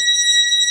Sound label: B3 C 5, ORGAN; VOCAL ORGAN